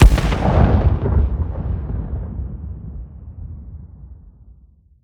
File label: awp distant, weapons; Counter Strike Model Pack; CS2